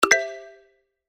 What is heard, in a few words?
Next option sound